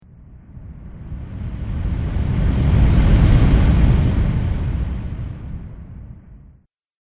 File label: Tundra Whoosh; Whoosh Sound Effects; sound design